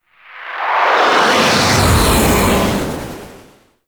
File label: Weapon Projectile Whoosh By; Enemies; Abilities; Ethereal; Warframe; Zariman; Void Angel